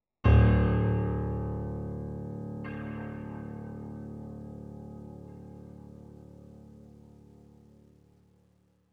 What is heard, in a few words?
One shot Piano; zombie army 4 dead war ps4 stadia switch windows xbox one gamerip 2020